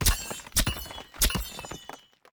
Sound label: scissor bricks